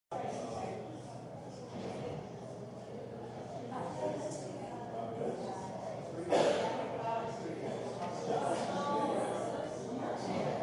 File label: ambient crowd noise